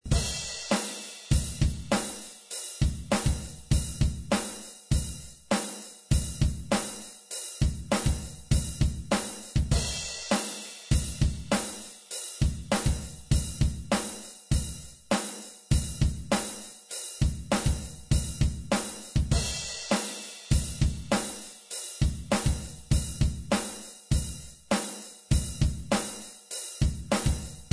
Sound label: Metal rythm 100